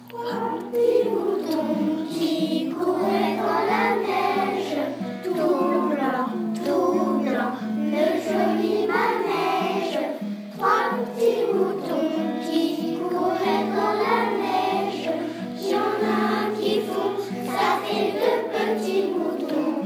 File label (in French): chant moutons